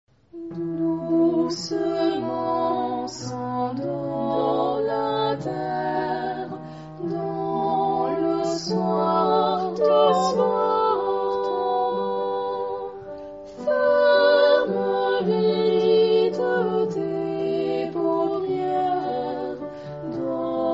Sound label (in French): Berceuse cosaque TUTTI